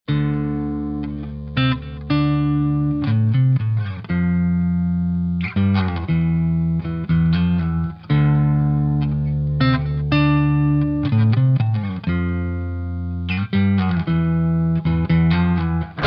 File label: heavy; Bei den Heavy-Samples nur auf die verzerrten Haupt-Gitarren achten.; ampvergleich